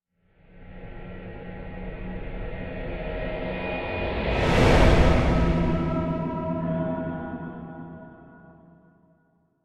Whoosh Transition Sci Fi Slow Metallic Vocal Elements; Whoosh Transition Slow Motion Flutter Magical Mystical Scifi Science Fiction; Whooshes; Sound Design